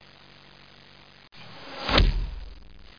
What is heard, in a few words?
thud